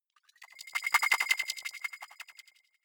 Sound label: sci-fi subterranean light up high